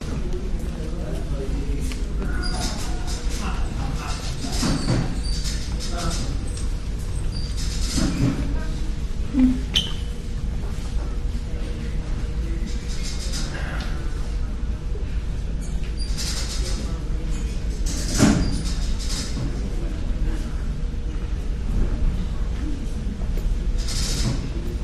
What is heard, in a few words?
seabus station walla steps walking people ambience thumping; vancouver; lonsdale quay; your free sounds